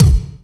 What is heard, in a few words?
puffy top end kickdrum sound d key, kick drum tuned to the D note